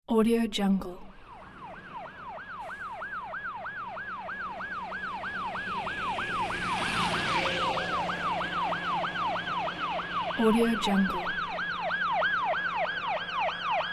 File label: police car